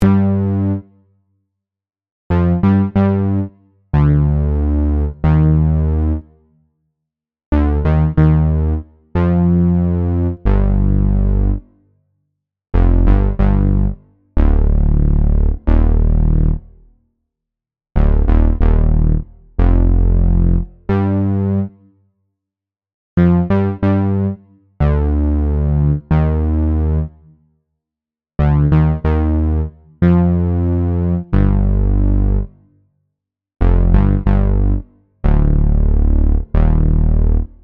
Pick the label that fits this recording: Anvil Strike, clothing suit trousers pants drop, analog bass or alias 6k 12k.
analog bass